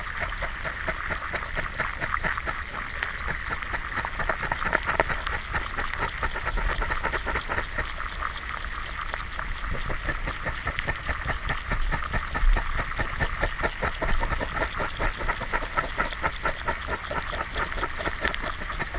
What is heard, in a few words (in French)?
Enregitrement mare; Vibrations; fourmiliere